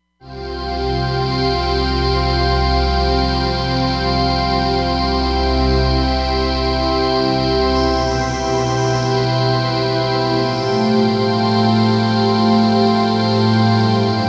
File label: Orchipad; Roland Fantom XR